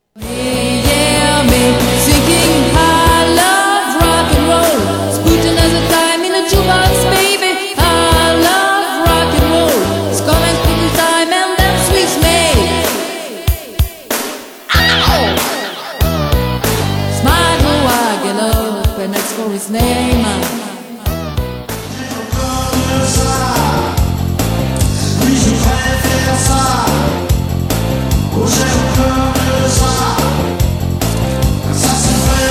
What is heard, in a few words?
rock